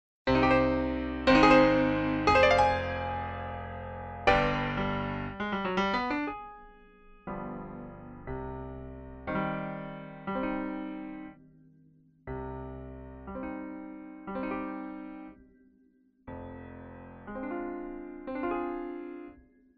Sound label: Czardas Eb; Monti